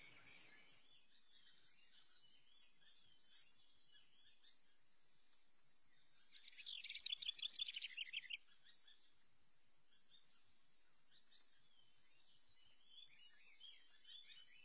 BOMB; ancient

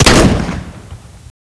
xm1014 fire; weapons; csgom; cstrike

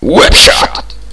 headshot

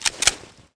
clip insert

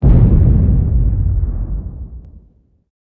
mortar; czero; de kabul cz

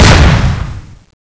plasmagun exp, weapons

